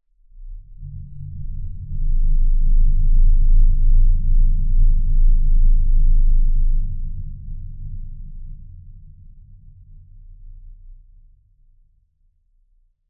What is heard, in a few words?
sound design lfe rumble air movement very low pitched short; zapsplat